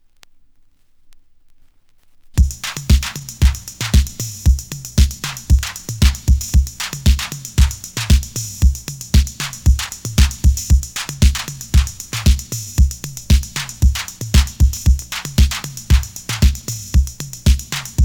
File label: rhythm dub version